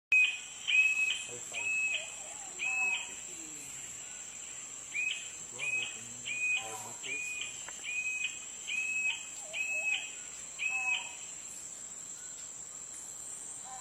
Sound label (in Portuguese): Canto do udu de bico largo